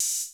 cymbal, Roland MC-909

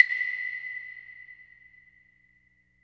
CLAVE WET; Percussion; InVision Interactive; Percussion Slam; DEJA VU

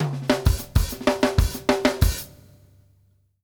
short hat, drum fills

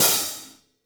hihat - opened; JazzFunkKit

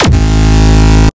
laser frenchcore kick

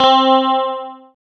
GUI, E-Guitar

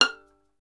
STR VLN, Solo Instruments; Miroslav Vitous

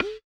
BUZZER; Spectrasonics; Metamorphosis

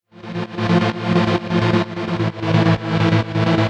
Gated Synthline (130BPM); musicradar

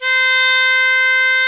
HARMO C4, HARMONICA; AKAI S-Series CD-ROM Sound Library VOL-7

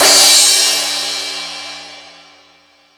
Crash, Drum Machines E-MU Pro-Cussion

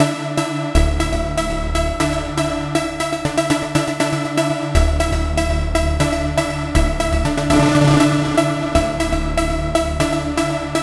Trance Synth

